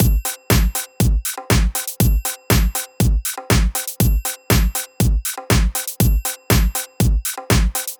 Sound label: Drumloop 120bpm, Nu Disco; Zero-G; Construction Kits; Legacy